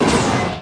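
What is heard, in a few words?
engine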